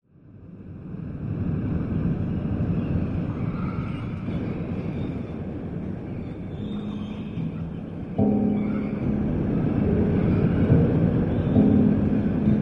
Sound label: Bells